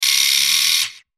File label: Sci Fi Servo Motor Small Robot; Servo Motor Small Single Rev